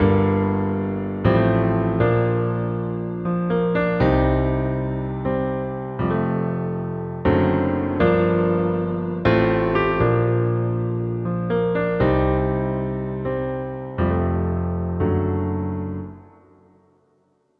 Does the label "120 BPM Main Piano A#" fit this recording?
Yes